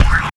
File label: Paper Hit; Sonic Foundry (Sony Creative Software); Esoterik Beatz; Paper Fx